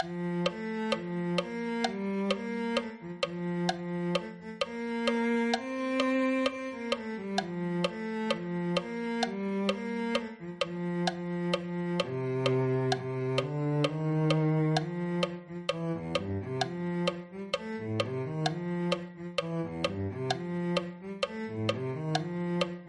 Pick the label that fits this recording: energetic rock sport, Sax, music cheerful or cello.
cello